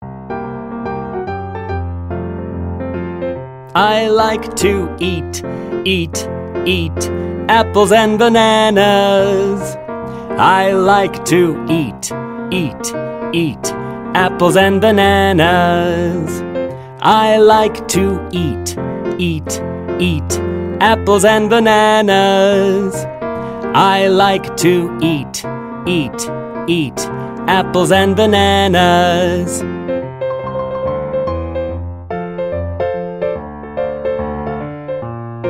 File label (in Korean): 영어남 동요; Applesandbanana